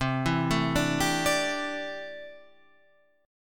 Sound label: C 6th Add 9th C